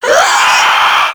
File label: Infested Crawler Attack Short; Warframe